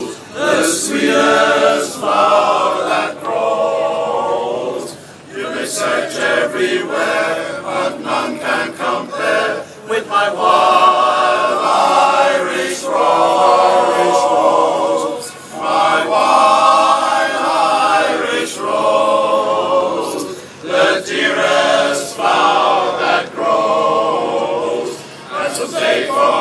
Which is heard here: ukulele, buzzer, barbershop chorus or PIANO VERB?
barbershop chorus